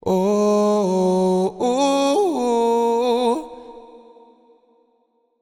Euphoria Ad Lib Wet - 150 BPM D# Min, Vocal Sample Pack; Cymatics; Ad Libs